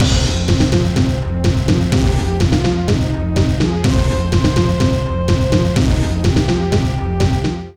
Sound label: hope jingle